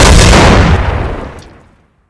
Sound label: explode, weapons; svencoop; echoes